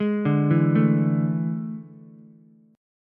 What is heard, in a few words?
A Major 7th Suspended 4th, Ukulele; arpeggiated